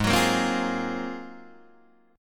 Ab 13th down Guitar Open D

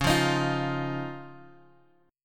Db Major Add 9th, Guitar Open G

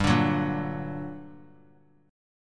G Minor Major 7th G, 3C, 3F, 3A, 3D; strummed; 19,21,21,20

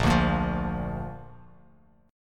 Bb Major Bb down Bass Standard, chord; strummed; JGuitar

